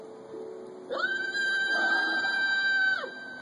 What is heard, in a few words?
Screaming